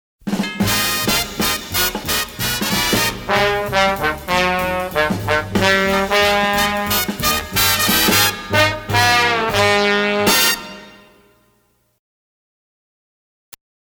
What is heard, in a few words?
trombone